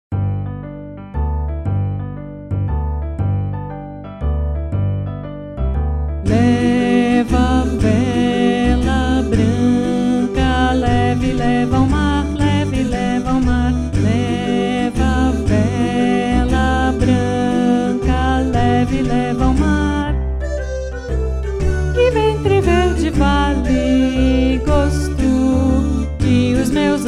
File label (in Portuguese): jogo mare naipe contralto